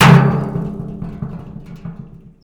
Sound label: hard hit, rust kit; dry; Nucleus Soundlab; Ambient Drums